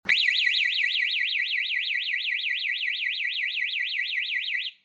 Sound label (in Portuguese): SIRENE 1 SOM